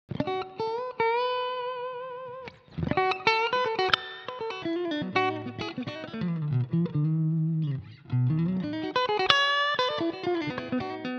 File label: Middle pick up